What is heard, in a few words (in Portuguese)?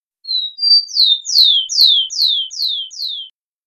Canto Tico Tico; Cemitério